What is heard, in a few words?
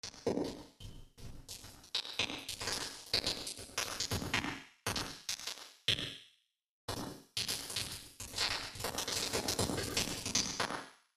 Small Room Bright; tcworksnative